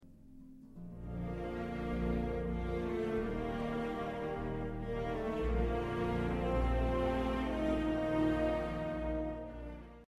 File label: Jingle